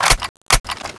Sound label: clip in